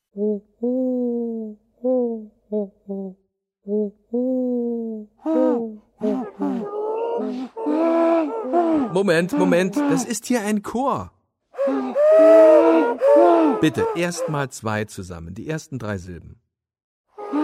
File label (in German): dialog mit einem ringeltaubenchor